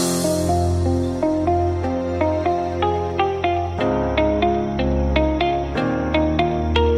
Message Tone; Apple

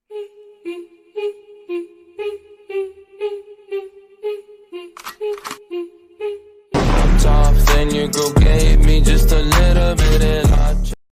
English Remix Ringtone

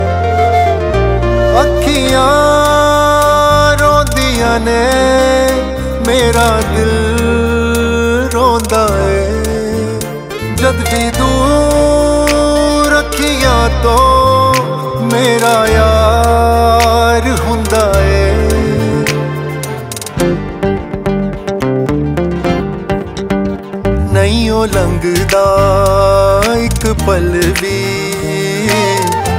Sad punjabi Song Ringtones

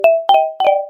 Sms Ringtone; Samsung Galaxy Note 20 Ultra 5G

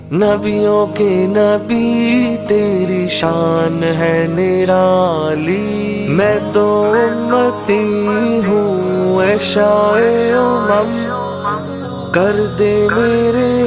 Islamic Ringtone

